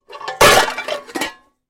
impact pots and pans crash bang distorted

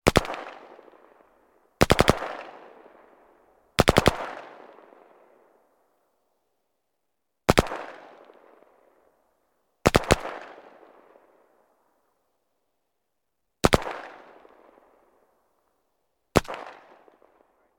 gun ak 47; still north media

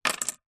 household keyring plastic drop wood

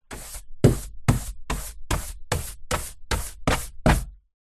brush hand held sweep internal hollow wall; household; zapsplat

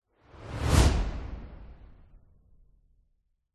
sound design transition whoosh med fast; zapsplat

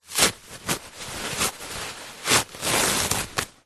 household toilet paper mass rip tear; zapsplat

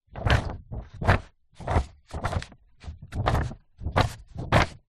foley paper sheet thick movements short; zapsplat

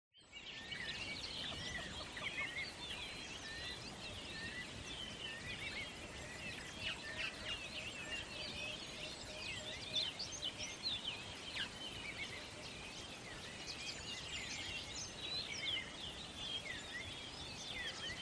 ATMO BUSH Water Hole Early Morning; smartsound

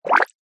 cartoon bubbles

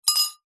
cutlery item set down into ceramic bowl; household

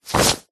clothes jacket thick high vis set down; zapsplat

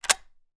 weapon gun 1917; still north media

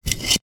office stapler loading mechanism pull back

